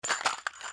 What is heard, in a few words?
Pickup Ammo